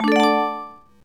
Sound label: Screen Change; Justin's New Sound Scheme; Happy Sound Scheme 1